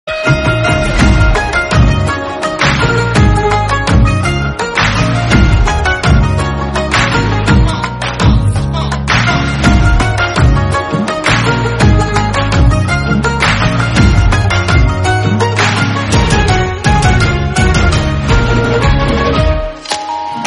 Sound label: Instrumental BGM